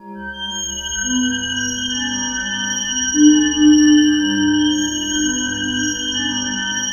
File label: COLUMNS, SCI-FI CHIME; Spectrasonic Distorted Reality 1